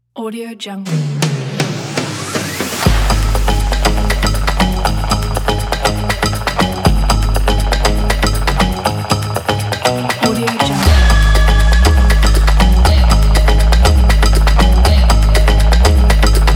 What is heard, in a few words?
fashion energetic beat